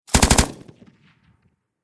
Warfare Machine Gun 7.62 Caliber Pkm Russian Short Burst Close Perspective; SFX Bible 6